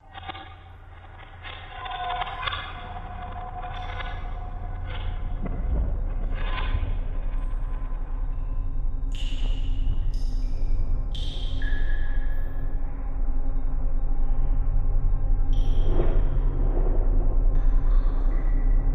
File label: The Cavern Ambience